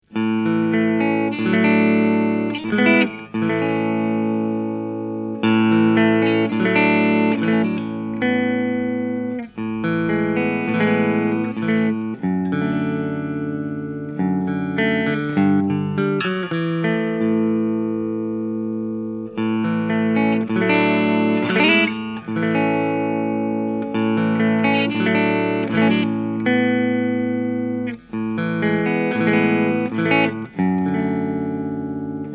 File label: Highwayman amp Clean ES335